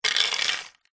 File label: industrial chain small set down wood